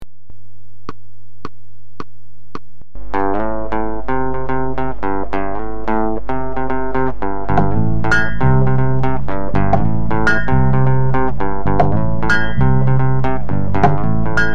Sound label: Instrumental